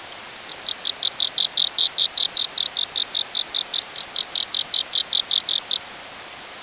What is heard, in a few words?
pond